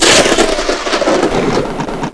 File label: STORM, NATURE